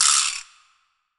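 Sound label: VIBRASLAP, drum machine; Drum Mashines; EdgeSounds; JD DRUMS KIT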